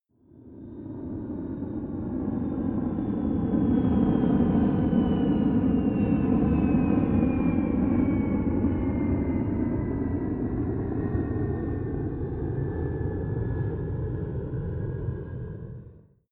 military jet a-10 thunderbolt flyby distant

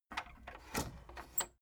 tape deck open

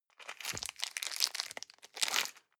plastic bag small pocket pull out hard

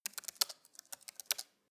keyboard typing

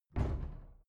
cupboard kitchen close

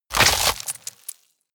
tear bone and flesh short

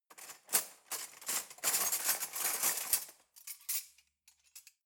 cutlery knife drawer rattle long

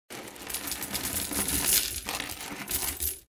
money noise

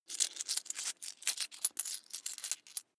coins search

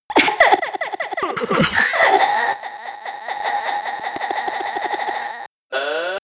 verbal noises

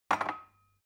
glass shelve wobble

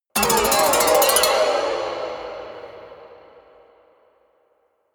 haunted strings weird stroke ricochet

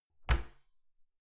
cupboard close dishes ringing soft

